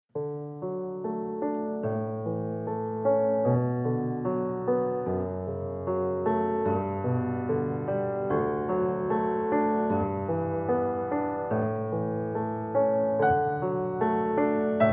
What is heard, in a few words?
Cannon in D